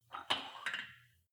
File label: weight rack pull out rattle